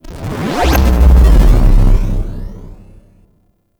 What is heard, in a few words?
UI Main Menu Login Success